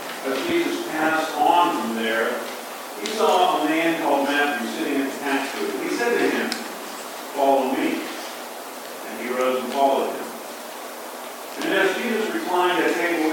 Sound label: Gospel